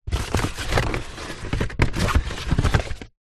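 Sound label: foley cardboard box fast food empty flaps movements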